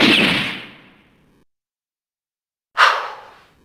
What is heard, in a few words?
shoot blow; deplume